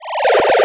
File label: Cry